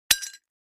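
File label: Large Tile Square Pieces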